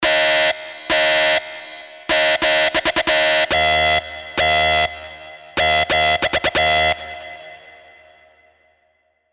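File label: Lead, Sylenth1; Dumpster Juice; alonso 19 year anniversary pack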